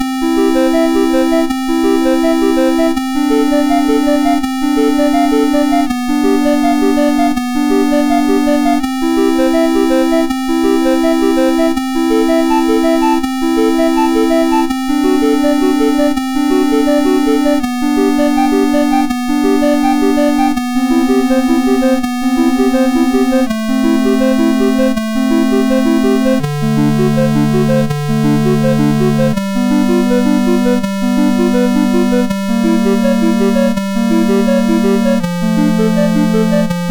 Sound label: Prelude I, MOS 6581R2; Lord UK